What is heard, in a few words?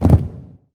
drop; library of ruina